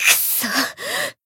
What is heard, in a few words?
damaged, jp; suzette